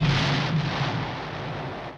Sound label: Explosion; BSG FX - Explosion 01